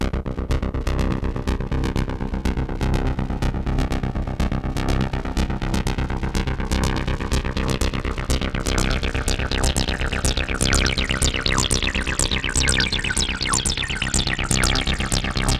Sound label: synth arp